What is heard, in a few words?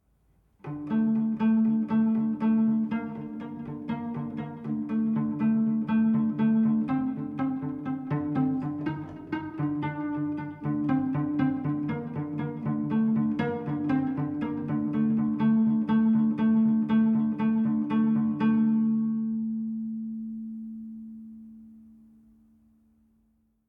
Savannah Music